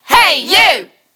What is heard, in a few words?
Serato Scratch Sample Hey You